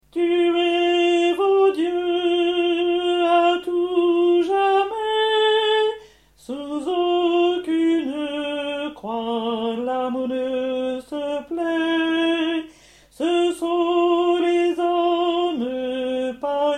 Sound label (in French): 4 hommes; ballade nord-irlandaise